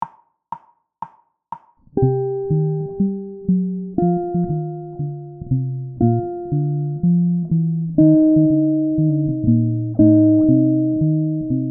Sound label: chord